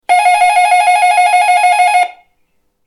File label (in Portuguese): interfone; deathmatch